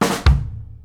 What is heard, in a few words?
KICK SNARE, Live Snares; PlatinumPhattCD1; E-MU Producer Series Vol. 8 – Platinum Phatt